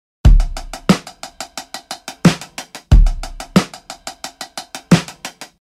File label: 180 CRISP HIPSTER LOOP